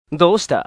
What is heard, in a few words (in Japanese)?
kougeki kyou, 攻撃強, 狂気バーサーカータイプ02; ゲーム用フリー音声素材集; 狂気系キャラ。若干声低め。; 普段は物腰穏やかだけど切れやすい感じ。; 大振りのモーションで暴れまわってほしいですな。